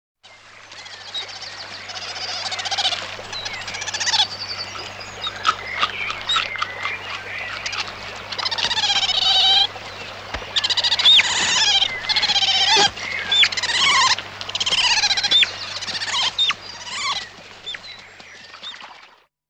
Lontra Lutrini; Lutra lutra; Versi Animali; Il Forum della Fotografia Naturalistica

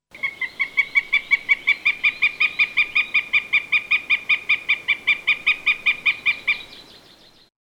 Astore Accipiter gentilis; Versi Animali; Il Forum della Fotografia Naturalistica

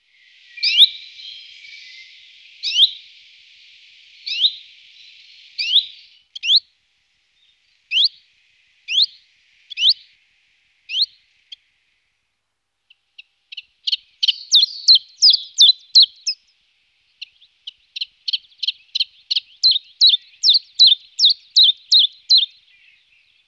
lui piccolo, Phylloscopus collybita, Versi Animali; Il Forum della Fotografia Naturalistica

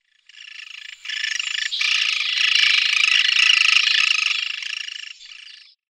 Picchio Tridattilo Picoides tridactylus; Piccoli al nido; Versi Animali; Il Forum della Fotografia Naturalistica

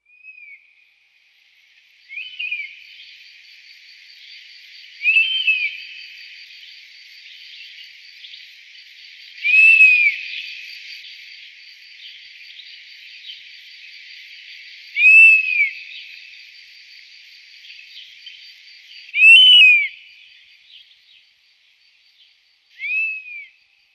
Falco Pecchiaiolo Pernis Apivorus; Crociere; Versi Animali; Il Forum della Fotografia Naturalistica

